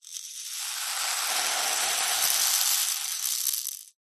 leisure toy rain stick ball bearings spiral fall in plastic tube; zapsplat